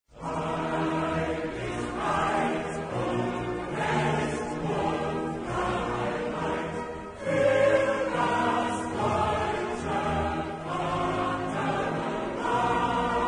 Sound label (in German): Deutsche Nationalhymne mit Gesang